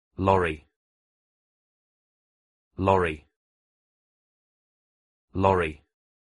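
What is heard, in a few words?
Lorry truck; gruzovik gruzovoj avtomobil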